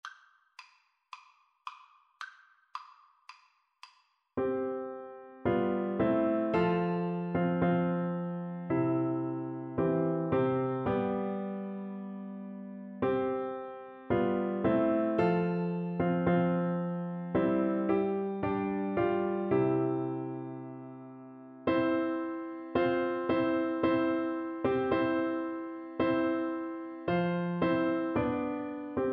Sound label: nearer my god, Double Bass